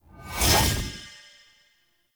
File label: Long Blade Proj Rune End; Powers; Runes